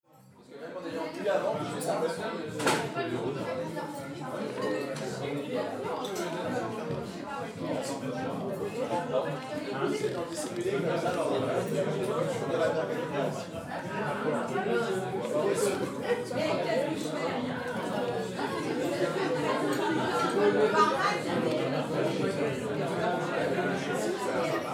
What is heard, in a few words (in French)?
Amb Estaminet petit restaurant avec table bruyante, Lille